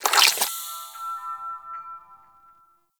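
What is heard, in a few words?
Power Shroom Projectile Pulse; Warframe; Nokko; Empowering Mushroom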